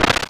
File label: break